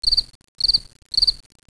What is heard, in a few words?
night